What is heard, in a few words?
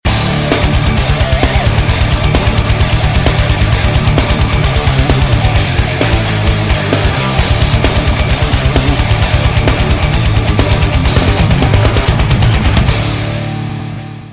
Redneck metal